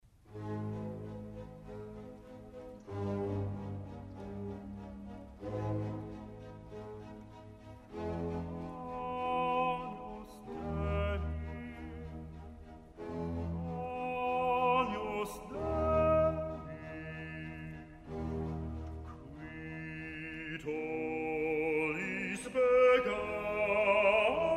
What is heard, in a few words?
Agnus Dei (Bass Solo); Haydn Missa Sancta Caeciliae